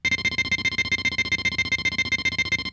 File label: Nord Lead 4 arpeggiator